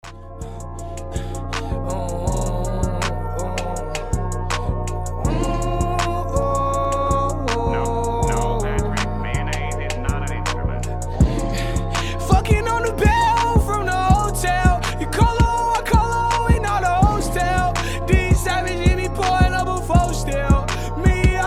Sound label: perc heavy